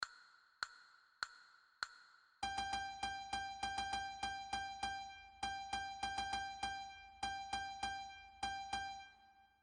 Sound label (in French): Sol, flute a bec